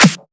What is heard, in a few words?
short clap sample d sharp key; single hits one shots